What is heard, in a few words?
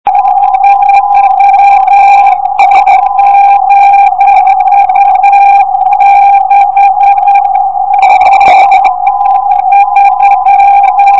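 320 kHz, non-directional beacon sound; ndb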